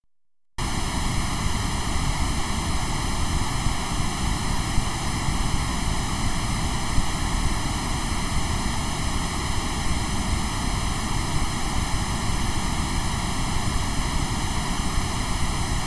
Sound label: Comb filter